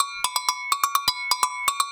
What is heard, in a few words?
Percussion Loops, Brazilian; Houseworx